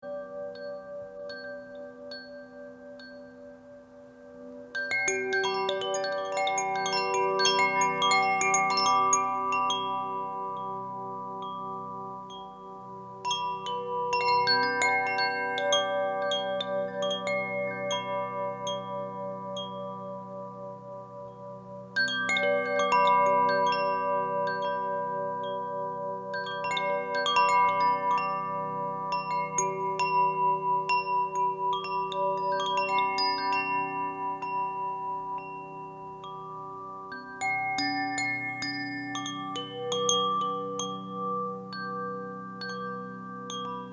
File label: Sound Meditation Mix